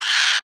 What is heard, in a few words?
pain